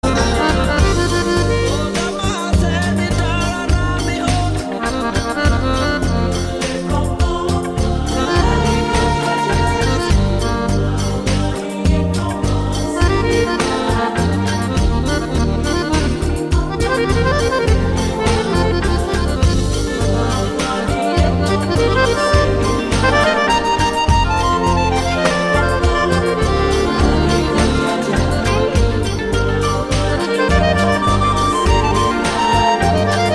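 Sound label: guitar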